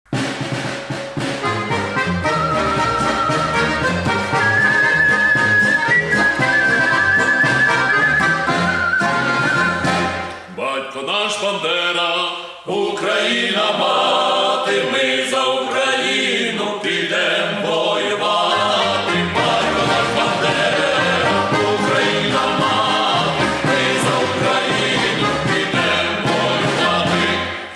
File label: ukrainian patriotic song